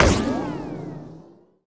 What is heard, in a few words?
hit me armor; Allegiance